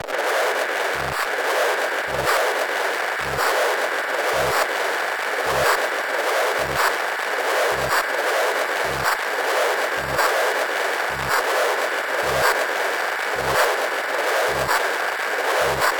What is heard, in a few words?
Static rings